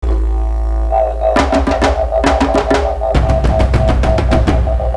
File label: Tribal Intro; Imagine Modern Dancer; Nintendo DS